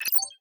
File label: slider Option Change